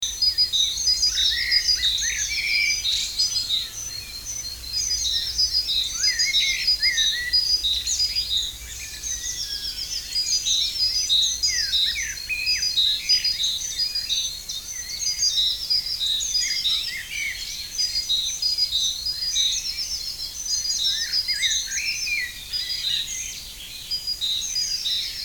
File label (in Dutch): Vogelgeluiden vroege ochtend